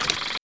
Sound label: shoot whril